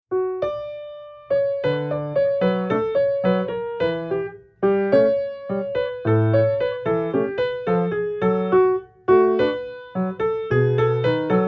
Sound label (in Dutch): Piano oefeningen; El portenito